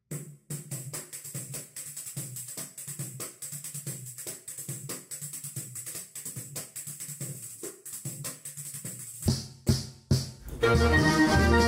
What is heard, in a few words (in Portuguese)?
Sorriso de Clarinete